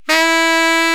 SAX E, SAX BALZY; Best Service ProSamples vol.55 - Retro Sampler; AKAI

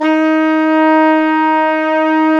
WND FGL SO, Hybrid Winds Flugel & Sop

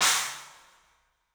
CYMBALS; 3D Sound; 3D Drums

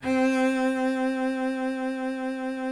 solo cello C3; Greytsounds VOL-1

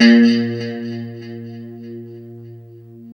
LO E A#2, Guitars; Spectrasonics; Hans Zimmer Guitars VOL-1; JC-SHEEN

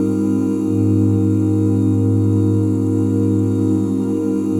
OOH G# MAJ9, OOH CHORDS, Vocal Jazz; ILIO; Vocal Planet; Jazz & FX

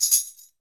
TAM FULL, NYC Percussionworks; Sampleheads; 90 s Sample CDs

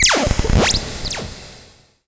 SHORT, SHORTNOIS

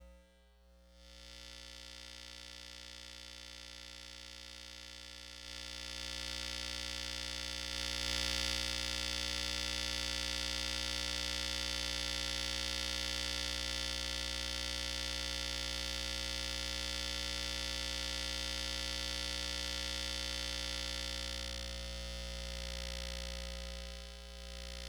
Electromagnetic Fields, Hummin; electric fields

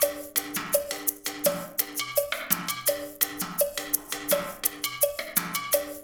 loop, percussion; InVision Interactive; Percussion Slam; CAFFEINE

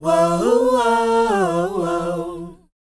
WHOA; ILIO; Vocal Planet

